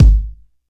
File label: round kickdrum sound g sharp key, kick single hit tuned to the G# note; kick drum samples